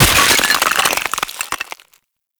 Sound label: Ice Hammer Impact, Weapons; Warframe; Tenno